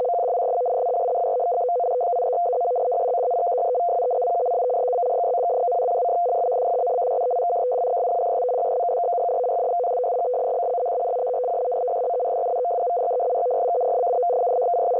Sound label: 8680.0kHz, numbers stations